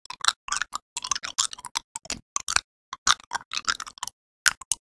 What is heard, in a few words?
eating a candy; human; NS Studios - ultra sound collection